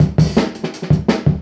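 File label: Jungle Breaks, 168 bpm; Original Jungle Breaks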